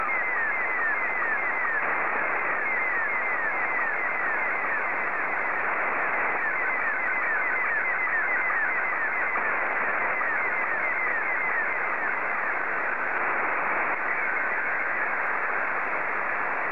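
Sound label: SSTV, Scottie 2; JA3OEN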